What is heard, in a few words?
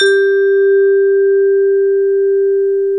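TONE G3, vibraphone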